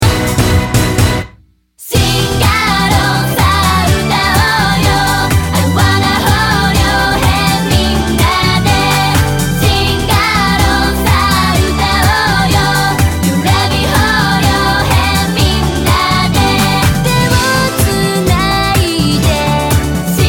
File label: singalong